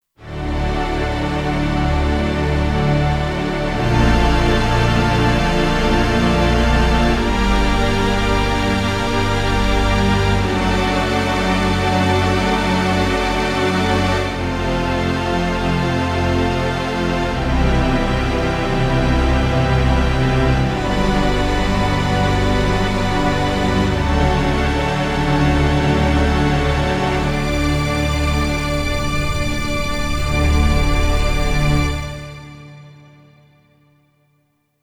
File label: Big Strings, Kurzweil K2500X; Factory Programs